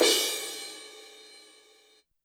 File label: Crash Cymbal; YAMAHA RM1X